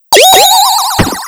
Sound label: catch hat; Pinocchio 1995 SNES; gamesoundopedia